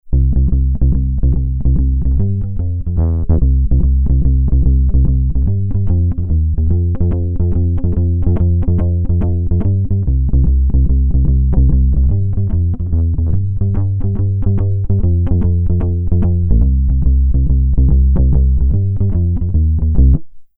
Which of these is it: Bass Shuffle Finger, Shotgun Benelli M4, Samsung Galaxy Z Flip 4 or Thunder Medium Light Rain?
Bass Shuffle Finger